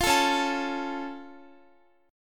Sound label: Db Augmented Db down Guitar; strummed